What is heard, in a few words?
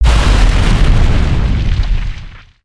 explosion; Exeter